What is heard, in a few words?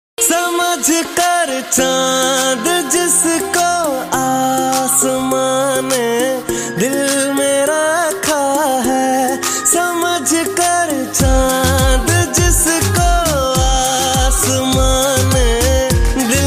Romantic